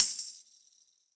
Tamb Warm And Low, Tambourine; Drum Hits; Standard Hits; Ableton; Session Drums Club

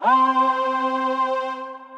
Pitched Ensemble, String Machines, Roland VP-330; Ableton; Factory Packs; Retro Synths

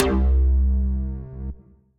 Tense Bass

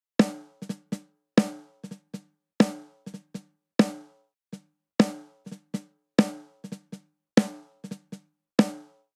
Linear Funk Snare Only 100 bpm; 4 4; MIDI Clips; Ableton; Factory Packs; Drum Booth

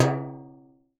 Metalbox; Convolution; NI Kontakt 5

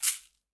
Caxixi Open; Latin Percussion; Drums; Drum Hits; Shaker; Ableton; Factory Packs

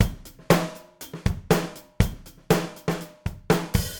Hybrid Kit Undamped Snare; Recording; Ableton; Factory Packs; Drumdrops

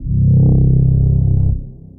Singularity Bass; Instruments; Brooding Bass; Ableton; Mood Reel

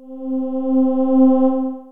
Synth Pad; Flux Capacity; Wavetable

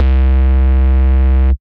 Bubble Bass, Synth Bass; Ableton; Factory Packs; Beat Tools

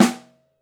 Snare Mono

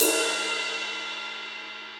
Ride Edge Direct Natural; Drums; Drum Hits; Standard Hits; Ableton; Session Drums Studio

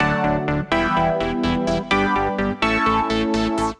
SynKeys (Em) 126bpm; Music Loops; Logan; Ableton; Singularities

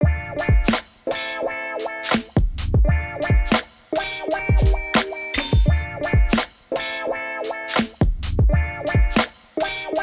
Kit Melodic 85bpm, Drums; MIDI Clips; Peace; Ableton; Chop and Swing

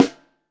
SN3 Top, snare

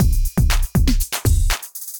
Electrified Kit; Electrified Kits; Ableton; Bomblastic

